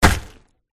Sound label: warrior attack contact middle